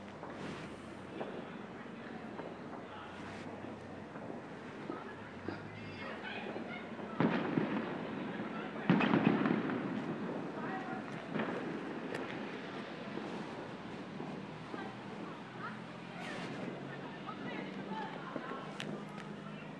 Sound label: new year fireworks